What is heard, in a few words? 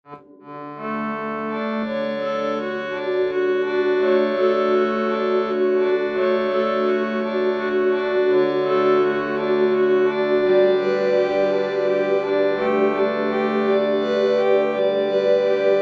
windpipes waltz